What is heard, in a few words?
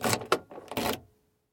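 crack of a broken door mechanism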